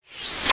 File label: inflate